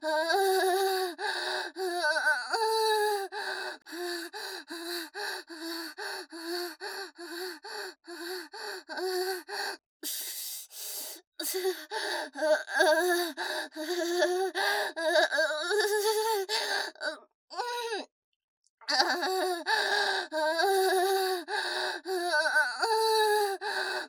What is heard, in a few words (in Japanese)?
喘ぎ声